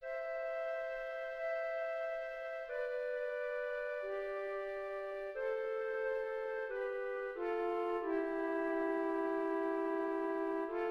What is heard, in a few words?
beginning flute duets C major